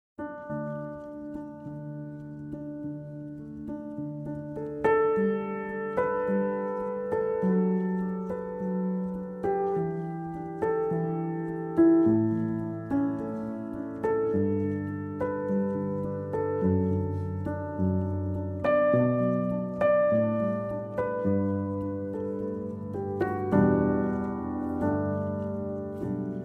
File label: piano miniatures